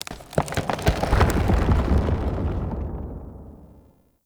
Root Cave Root Movement; Ambience; One Offs; Neathuns Root Cave

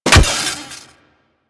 Melee Staff Impact Robotic Flesh

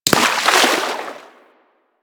Melee Impact Water; Warframe; Weapons

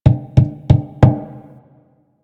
Finger Thump; Warframe; Rail Jack Hunt

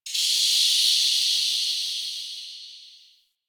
steam blast; Ambience; Warframe; Hub; Tenno Junction Relay

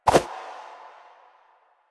Run Whoosh, Footsteps; Enemies; Soldiers; Warframe; Lasrian; Butcher

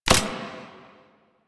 Shotgun Shot Mech; Weapons; Warframe; Pax Duviricus

